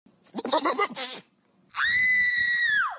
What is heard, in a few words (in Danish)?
en pige skriger af en ged